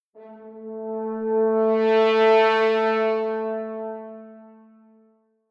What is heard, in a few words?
MIDI Modulation Intonation